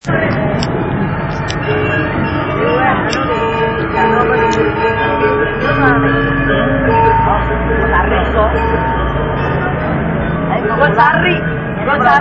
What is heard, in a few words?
indonesia sounds; geopoesia